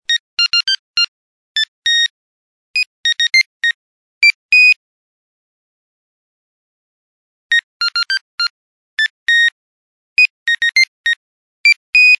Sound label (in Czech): Melodie Nokia 5110